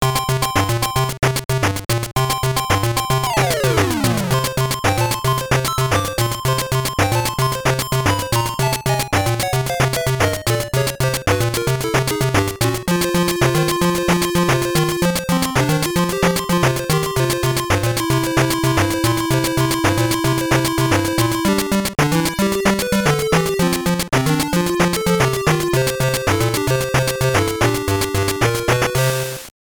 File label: BGM; tetris blast; sgb